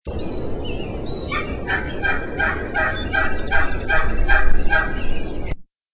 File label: Yelp Hen, turkey; wildlife